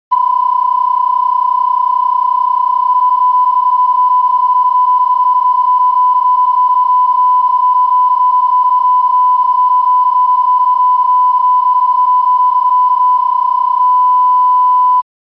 PSK31 bpsk mode

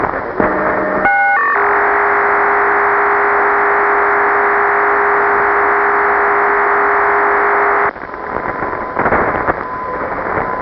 Digital Voice Scrambling

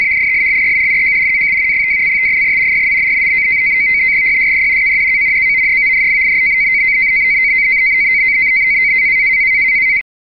Amateur AMTOR FEC

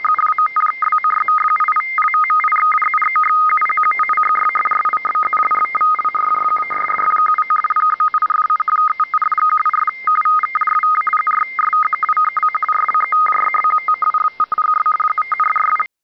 RTTY Radioteletype (Baudot 50 baud)

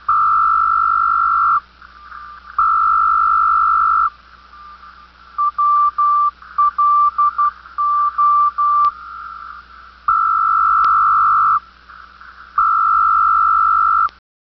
SITOR-A Telex-Over-Radio Marker

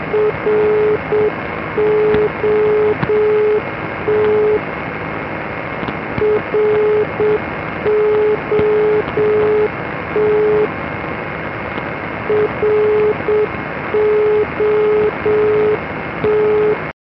Non-Directional Beacon

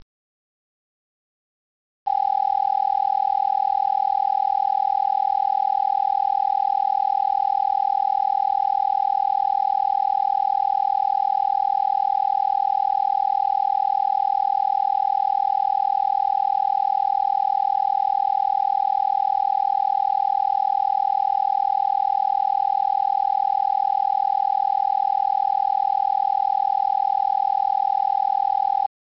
Coherent on MS25

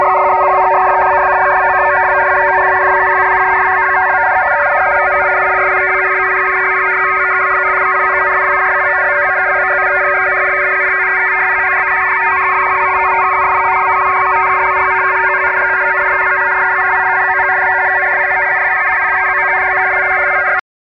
Voice Frequency Telegraphy RTTY

